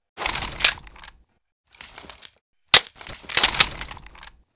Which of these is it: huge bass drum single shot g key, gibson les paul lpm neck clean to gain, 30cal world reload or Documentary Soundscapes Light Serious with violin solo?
30cal world reload